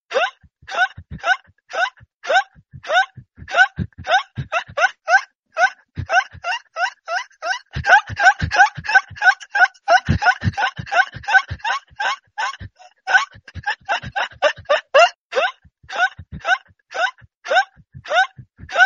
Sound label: dolpin laugh